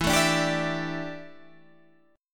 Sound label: D Suspended 2nd Suspended 4th E